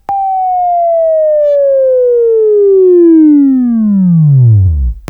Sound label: Buzz; Labview Program; Sweeping Training program